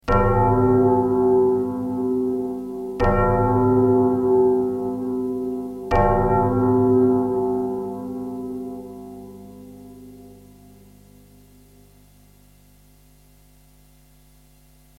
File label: Bell A3